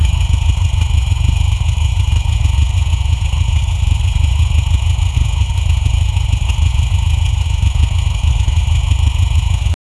create a truck engine idl, low rpm

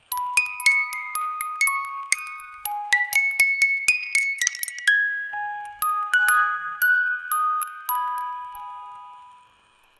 old broken music box play

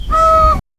turkey like bird sound; bird call

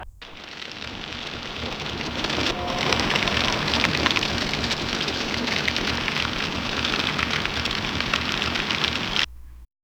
a tree rapidly growing, sprout; a tree rapidly growing fr

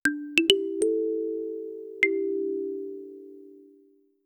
minimalist pleasant xiaom

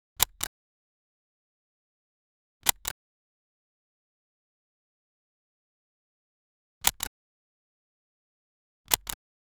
camera shutter click

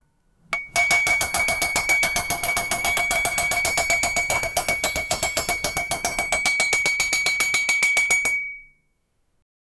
blacksmith hammering hot

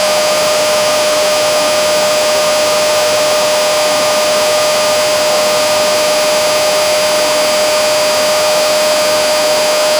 flattened and filtered; consistent

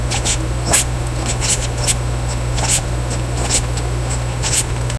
sound logo for a fabric, craft

